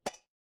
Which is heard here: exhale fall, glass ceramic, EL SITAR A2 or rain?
glass ceramic